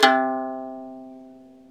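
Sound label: ethnic plucks; Eth Plucks, Pluck Duo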